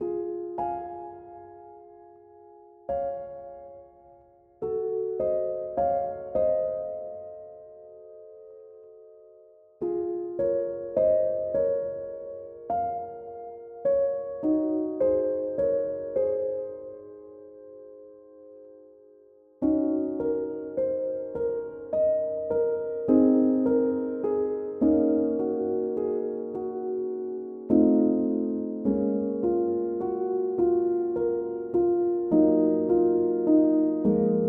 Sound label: Claire De Lune (Piano)